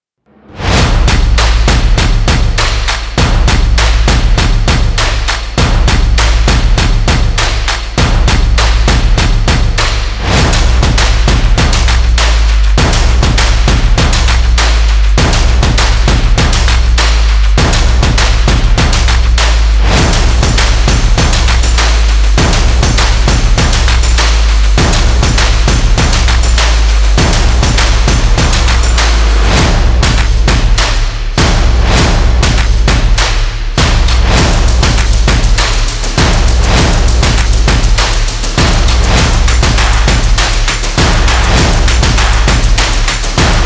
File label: Stomp Percussion Trailer; energetic